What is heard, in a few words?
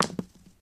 roll, pig